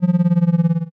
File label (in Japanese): wanawana